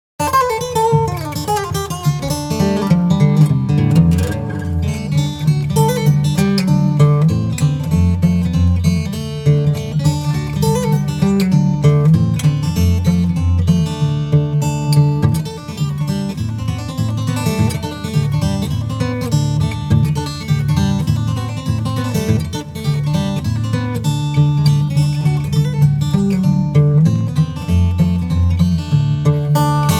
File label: Humoreske